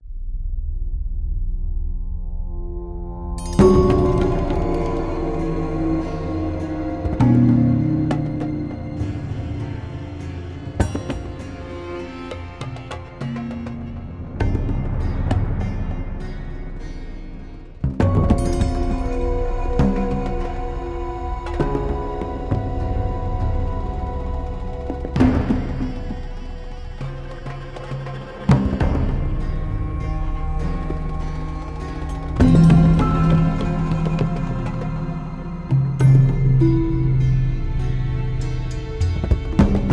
Loop Stealth

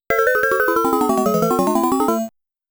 Mistake Music; galaga

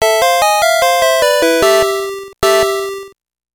Won Battle; Ayakashi no Shiro; gameboy

